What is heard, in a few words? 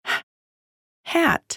HAT